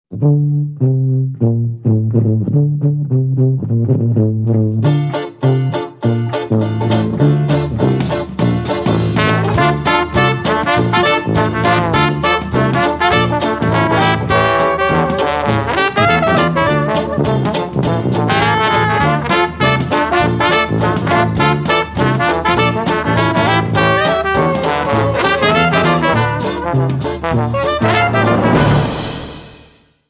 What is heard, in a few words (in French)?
Musique